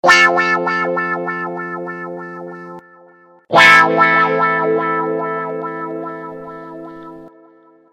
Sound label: wahwah